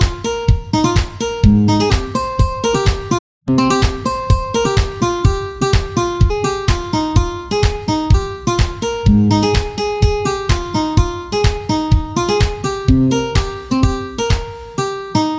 Nokia E72 Piano

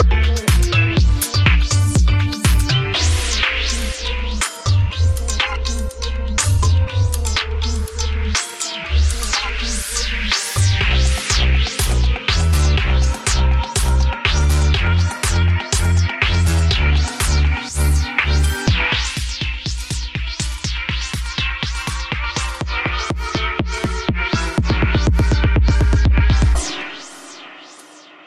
Huawei Mate 40E 4G, ringtone

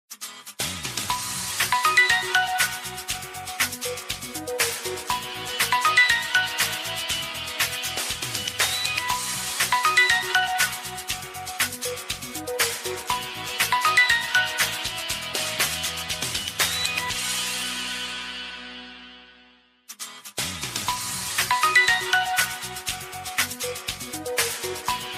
Galaxy A70, ringtones

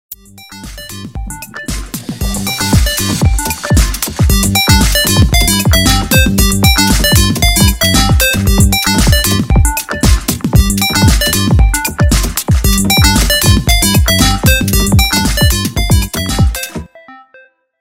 Vivo V23E

